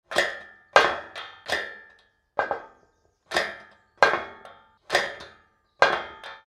Farm Gate Open Close, Metal bar gate open and close various; Gates; Doors; Tom Hutchings